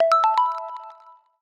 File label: Shimmer, notifications; Honor Pad X7